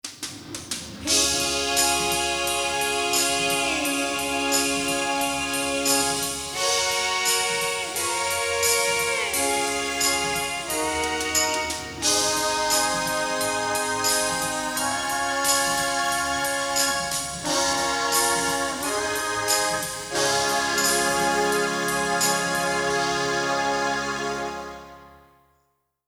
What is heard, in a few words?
Backing Trax